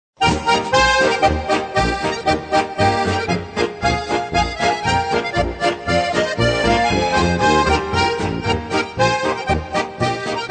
polka

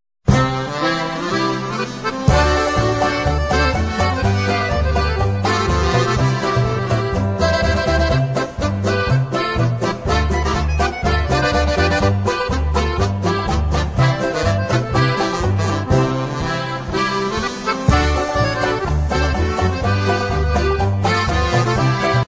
HAYRIDE POLKA

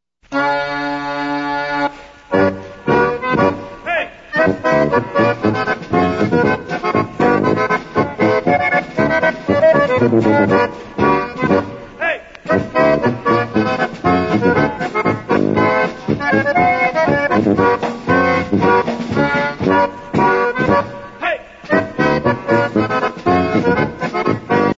POLKA

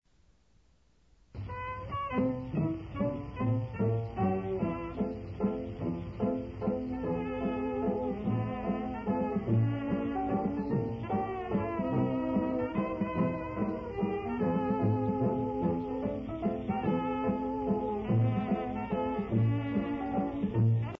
waltz

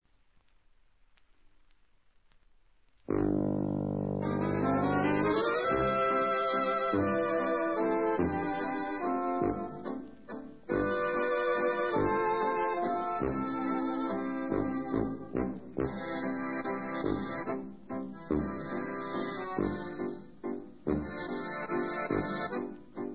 waltz

